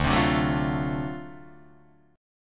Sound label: D 7th Sharp 9th D down Guitar Open D